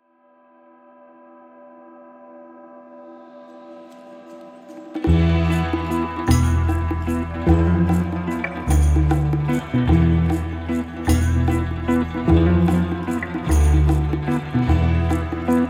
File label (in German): Gitarre; Musik für Kinder Hörspiel